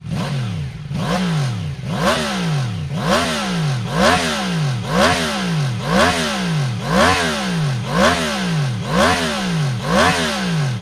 hypercone slip on exhaust yamaha fz10; remus

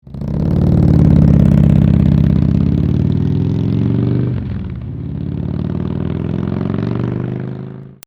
TAKEOFF

